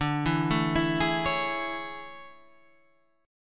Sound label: A Major 7th D